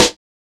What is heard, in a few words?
Snares